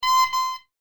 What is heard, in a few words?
horn